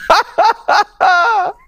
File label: laugh